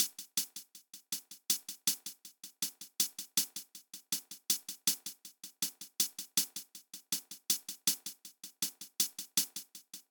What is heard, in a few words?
160bpm, drums